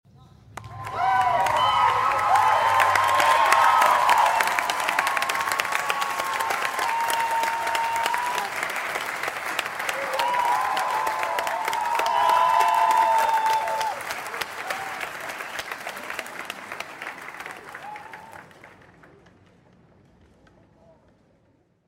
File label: celebration